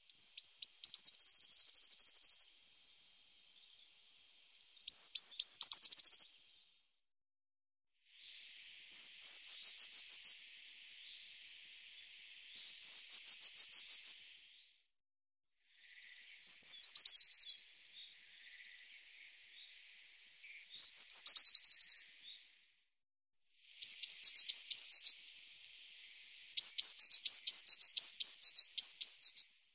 Ptaki - Modraszka

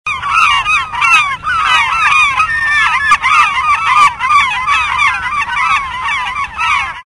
Ptaki - Mewy

